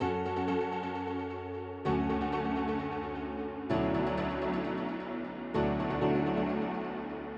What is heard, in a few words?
Drone